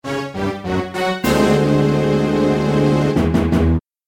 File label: Caught Fanfare; Zelda